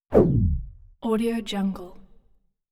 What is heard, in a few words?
deep scifi whoosh; hollywoodedge